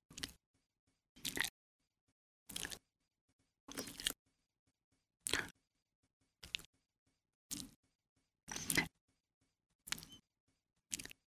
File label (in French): bruits de bouche